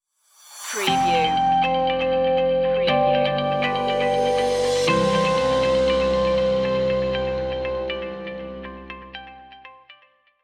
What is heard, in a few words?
corporate innovations stinger